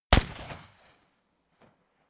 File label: rifle ak-47 exterior fire single clean bright; comedy cartoon; pistolen gewehre; Pistols and guns